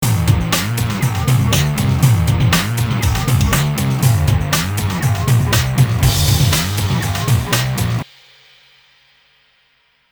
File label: depressing rock music